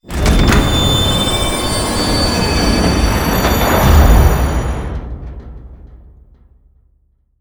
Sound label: grav2; ships misc